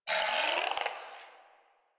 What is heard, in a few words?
Shadow Vocal Idle; Warframe; Duviri